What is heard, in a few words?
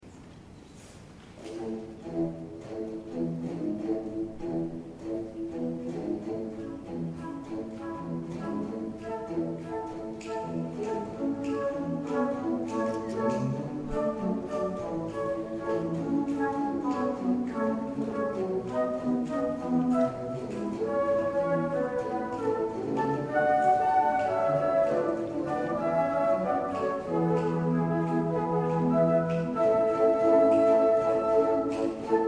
consert, flute; arietta-flute